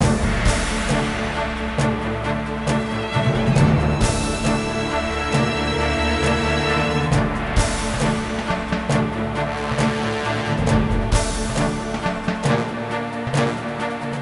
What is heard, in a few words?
Wizard Battle; Lego Battles 2009 NDS